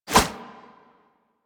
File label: Inf Punch Whoosh D; Warframe; Weapons; Infested; Inf Kick Punch